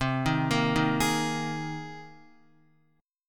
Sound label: C Minor 7th Sharp 5th, C, Guitar; arpeggiated; JGuitar